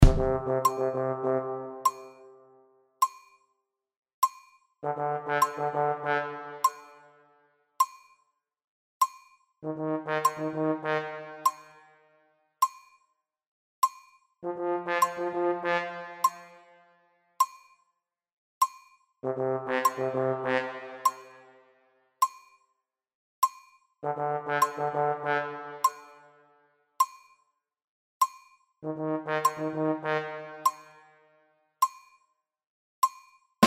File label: break